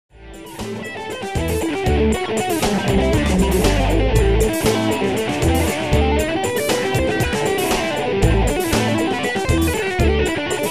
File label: solo